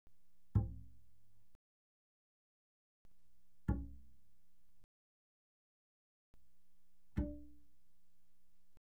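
Bass pizzicato mezzo forte sul D C4 D4, doublebass; Strings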